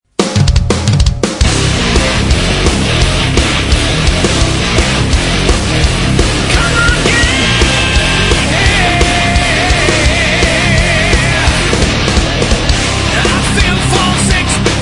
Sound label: Dirt Metal, Rock